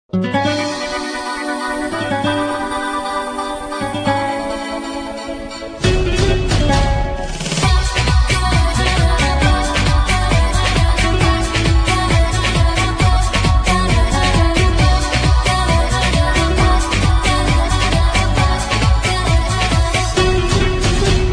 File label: blues song